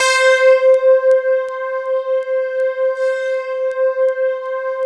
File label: C4 FM, Synth Line; 90 s Sample CDs; NiceBeats; SynthLine VOL-20